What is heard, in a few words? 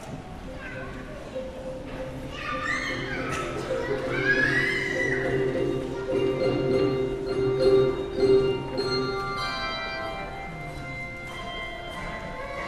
Handbells; Easter Joy; Behnke